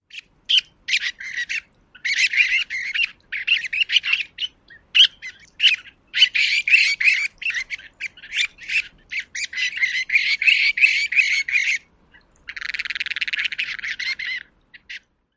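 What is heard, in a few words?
calls, Rock Sandpiper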